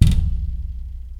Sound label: glass dug; everness